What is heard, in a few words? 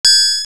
Kinstone Vanish; Kinstone vanishing after fusion